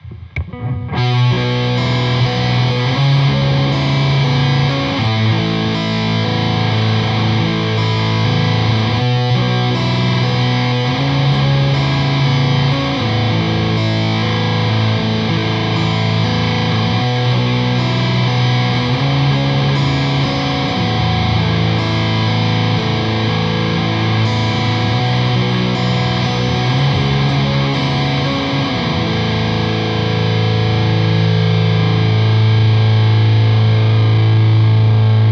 gitarre metallary; presonus ampire xt metal pack test

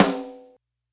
snare drum bandsaettigung; fett klingen lassen; Mixdown Tutorial: Snare Drum anfetten

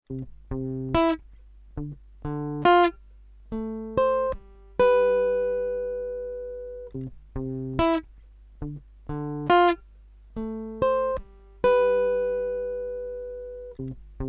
tb audio kong lucky loop akustik loop

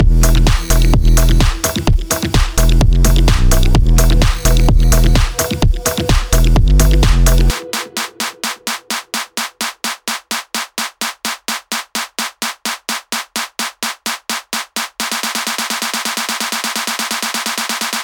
edm build up vorher

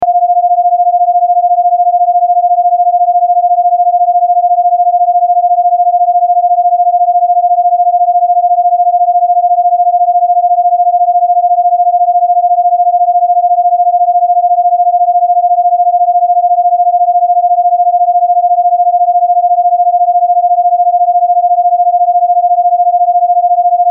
binaurale beats beta waves 14 Hz

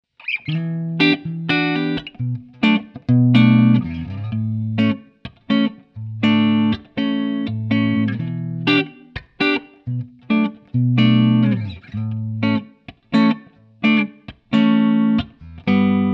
Gibson Les Paul Standard test clean chords hals normal

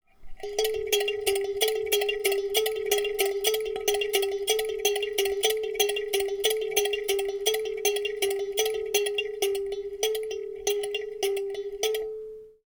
zoom h4n pro spieluhr

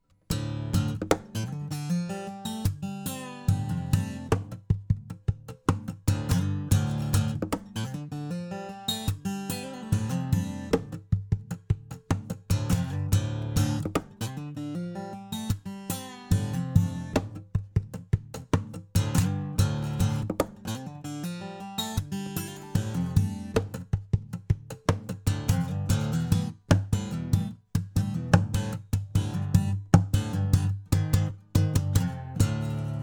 niere perkussiv lowcut korpus hals bauch; fame pro series sd-100 test

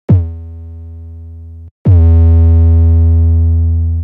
a b ohne sustain; 808; Der ultimative 808 Producing Guide

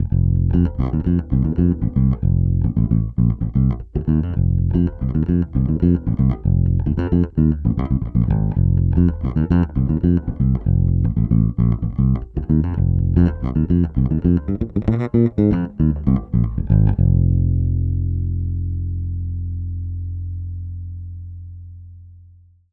jazz bass gezupft line; phil jones double four bg-75

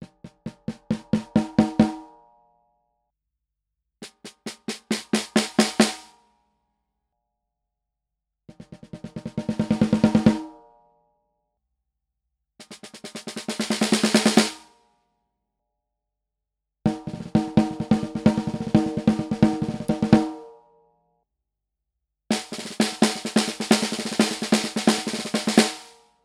beyerdynamic tg d35d snare stativ oben unten

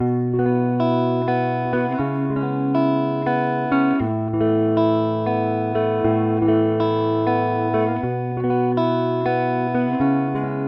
Kammfilter 2.8 ms

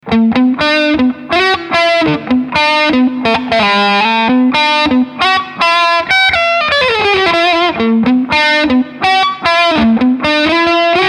epiphone casino coupe steg p90 overdrive

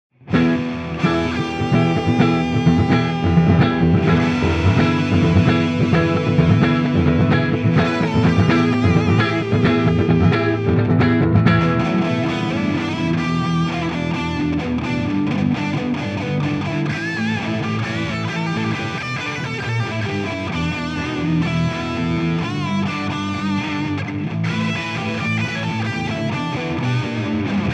Spark nur Amps; mercuriall

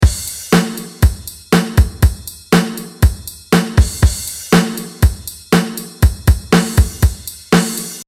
drums bearbeitet

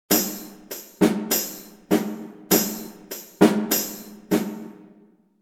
Akzent Synkope; songwriting

